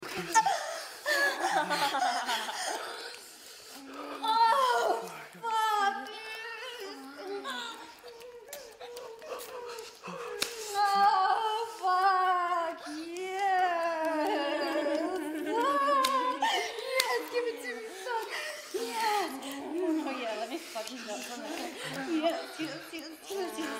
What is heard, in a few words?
moan